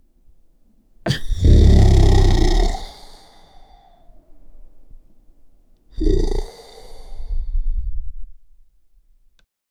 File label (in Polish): delikatne wypuszczenie, dinosaur